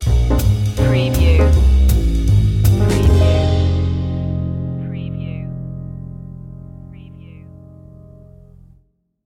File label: in paris stinger